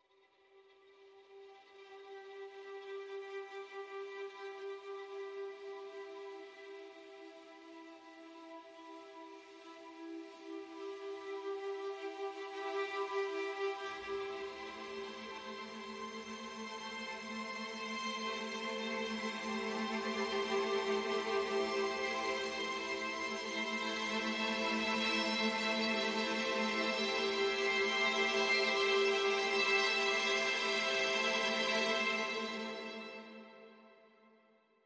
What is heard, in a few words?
High Strings Only Mix